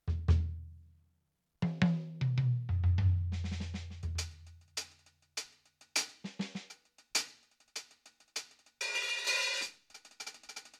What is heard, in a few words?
YAMAHA DTX8K M Old Kit